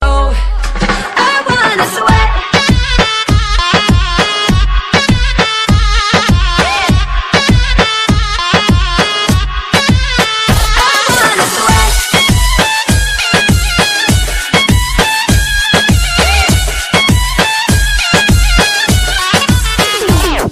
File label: english crazy ringtone